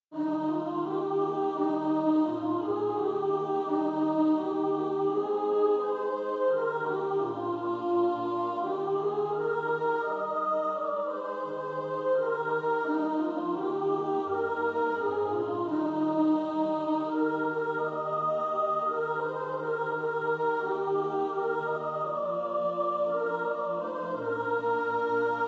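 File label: soprano, practice music, SATB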